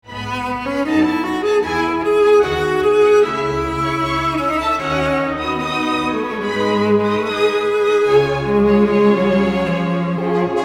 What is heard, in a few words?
FIRST MOVEMENT